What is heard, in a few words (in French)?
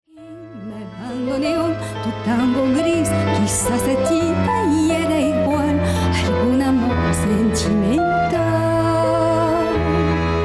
tango récital spectacle milonga chant